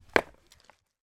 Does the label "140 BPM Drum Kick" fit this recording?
No